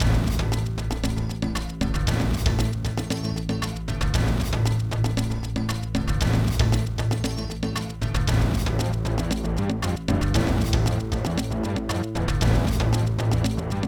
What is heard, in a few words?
The Walking (Action); beta unreal